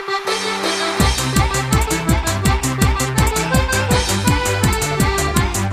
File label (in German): Jingels